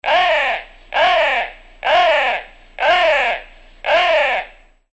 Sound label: crow